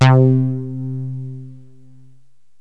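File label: sequencer line, synths; Rubber Chicken Software Sample Bank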